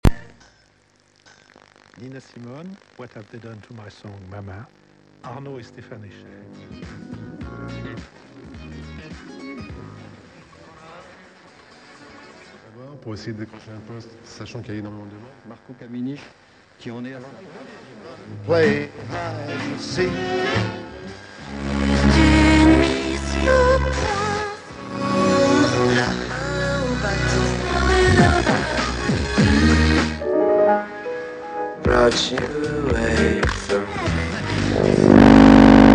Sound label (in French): balayage